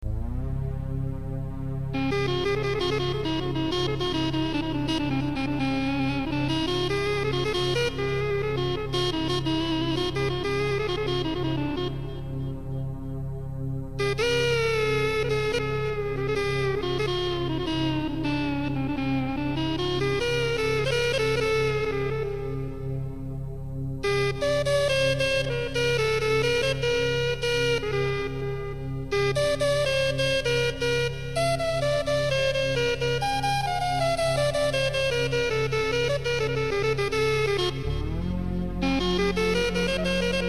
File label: Clarino